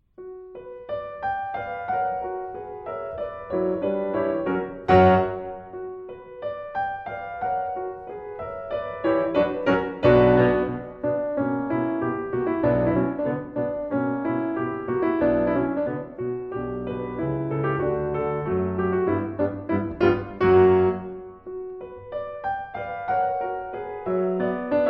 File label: Piano Sonata B Minor Allegro; Woelfl; Op28